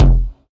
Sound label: low tone kick drum single shot f key; kick drum samples